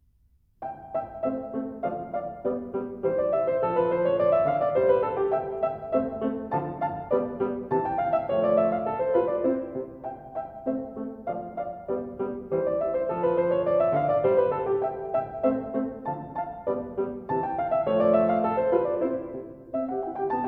Piano Sonata No. 16 in C major (K545) - III - Rondo; Mozart; Keyboard Works